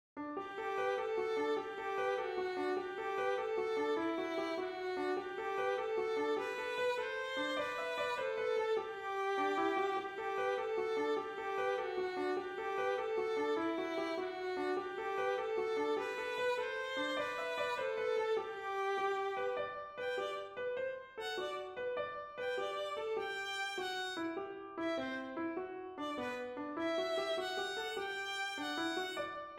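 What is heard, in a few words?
jig, melody harmony; Crooked River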